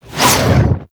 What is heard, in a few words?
Scythe Whoosh; Warframe; Weapons; Stalker Scythe